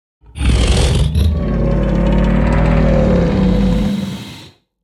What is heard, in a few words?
crocodile roar; soundscrate